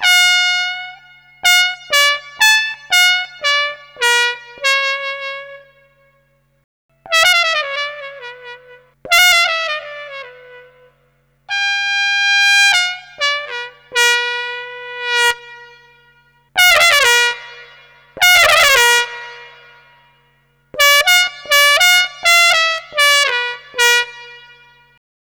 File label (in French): trompette; Modeles Physiques